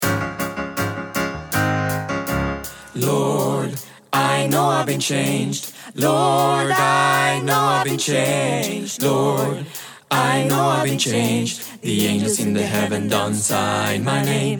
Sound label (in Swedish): Alt bas; trad